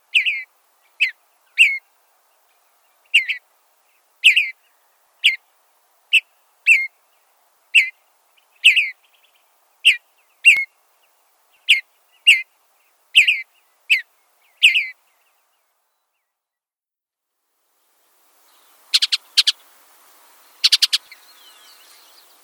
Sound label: Western Bluebird